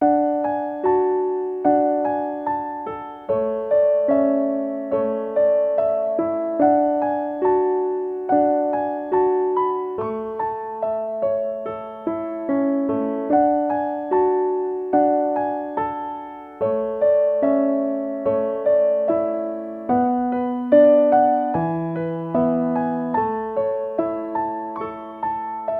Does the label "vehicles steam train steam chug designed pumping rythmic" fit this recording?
No